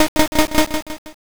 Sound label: chew